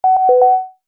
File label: Multimedia Event Notification, beep sound effect